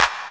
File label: Claps; Vengeance; Essential Clubsounds Vol.4